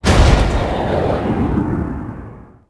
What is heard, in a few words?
Jet Hurt; Civ4 warlords